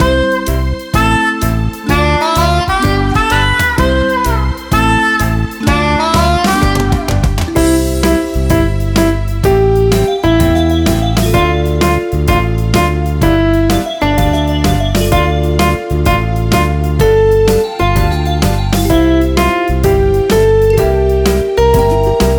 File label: karaoke